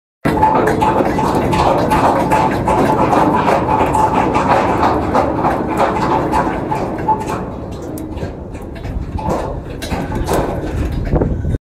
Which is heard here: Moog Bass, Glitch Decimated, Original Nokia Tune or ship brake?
ship brake